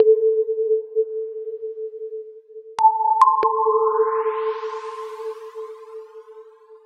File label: 140 A BONUS MUSIC, Deep Dubstep; loop; Loopmasters; Jack Sparrow; Deep Dubstep Progressions